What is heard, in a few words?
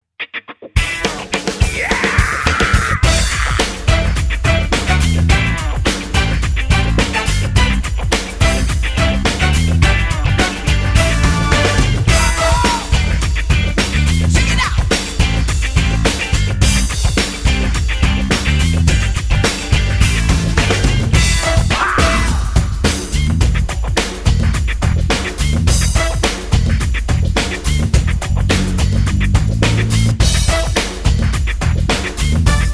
street dance